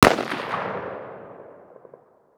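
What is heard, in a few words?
m16a1 single distant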